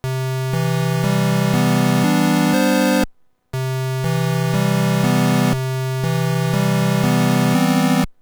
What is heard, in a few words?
test poly